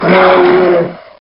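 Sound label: gargoyle die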